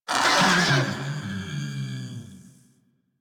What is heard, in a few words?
Kubrow Armored Vocal Death; Enemies; Warframe